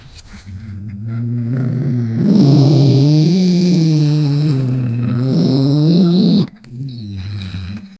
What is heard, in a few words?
amidst the chaos a three, childhood